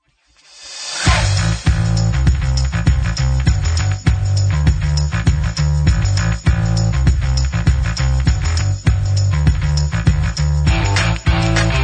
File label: Upbeat Rock